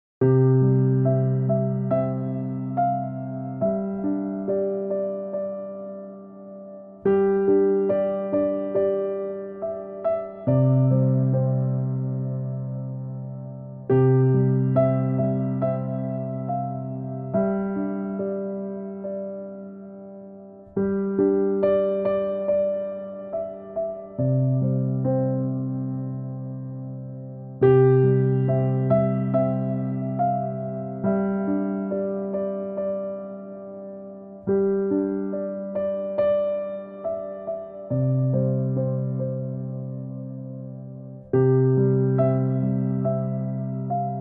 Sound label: Sample of childrens music